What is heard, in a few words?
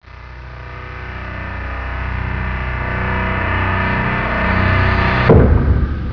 failed; JOSEPHIN